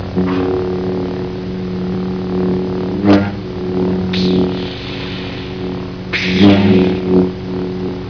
laserschwert lightsaber practice; sms sound; star wars

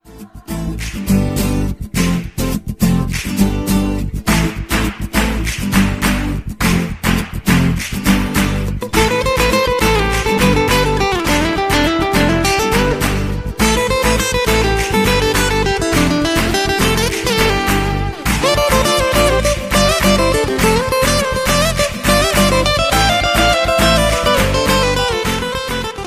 Gitarre E-Gitarre sms ringtone; Klingelton

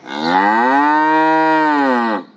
Kuh muhen sound

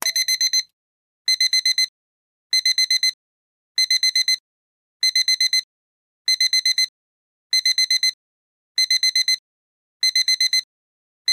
wecker

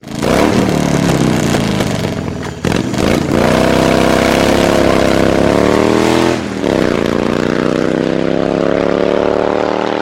Harley Davidson Motorrad Sound

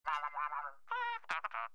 i phone klingeltoene robot

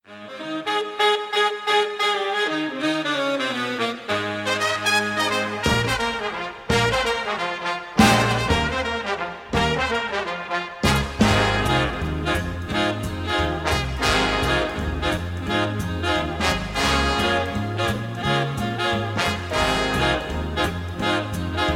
Klingelton Jazz von Motorola ROKR swing jazz

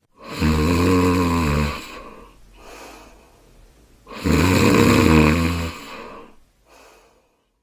schnarchen klingelton lustiger schlafen sound

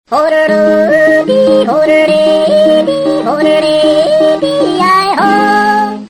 jodeln

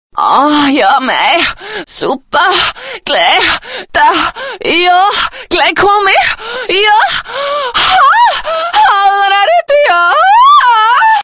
orgasmus bayrischer orgasmus

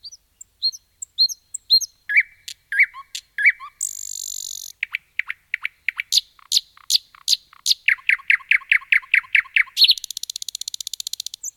nachtigall klingelton gesang vogelzwitschern

